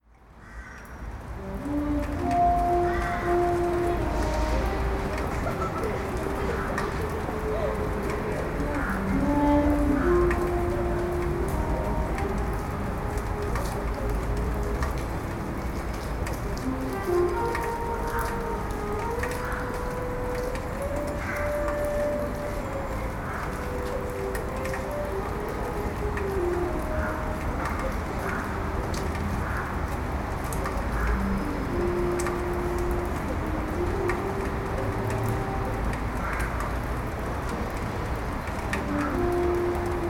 sax player rehearsals, sergiyev posad

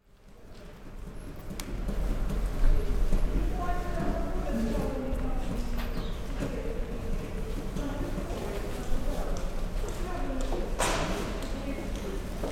coach station; sergiyev posad

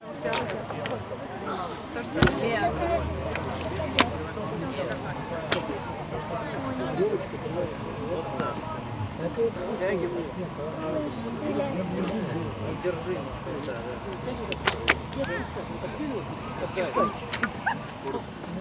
arrows; ratniki prepodobnogo sergiya festival

